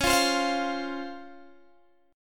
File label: Db Major 7th, Guitar, Open G